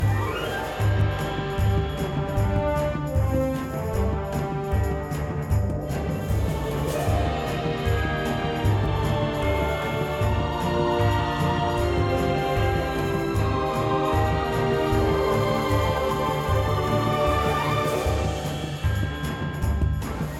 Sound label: Music Farm Power Up; My Little Pony: Rainbow Runners; Android